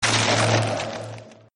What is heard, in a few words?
wyvern bullet sound; Arc of Templar